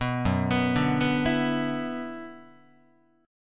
F sharp 7th Suspended 4th Sharp 5th, Guitar; 2,2,2,0,3,3; 4A,4E,5A,5D,5Gsharp,6Csharp; arpeggiated

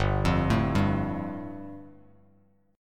G 7th Suspended 2nd, Bass Standard; arpeggiated; JGuitar

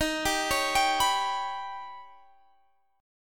Eb Augmented 7th, Guitar Standard; arpeggiated; x,18,17,18,20,19

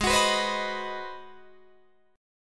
G sharp 7th Sharp 9th, Guitar, Open D

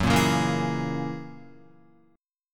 F sharp 9th F sharp Guitar; Listen to F#9 strummed

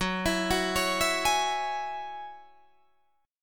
F sharp 7th Suspended 2nd, Guitar Drop D

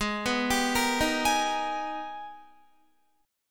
Ab Minor Major 9th, down, Guitar; AbmM9; arpeggiated

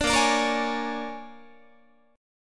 A sharp 7th Sharp 9th A sharp down Guitar; A#7#9; strummed

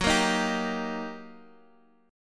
G Minor Major 7th Sharp 5th, F sharp; GmM7#5/F# strummed; JGuitar

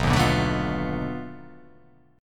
B 7th Sharp 9th Flat 5th, down

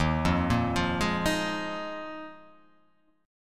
D sharp Suspended 2nd Suspended 4th D sharp

